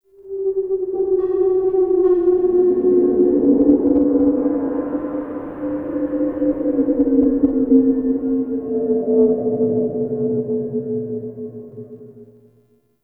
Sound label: Exterior Dark Ghost Swirl, Ambience; Warframe; Railjack